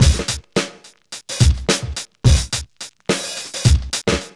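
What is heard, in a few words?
107 bpm, Funky loops; Zero-G; Funky Elements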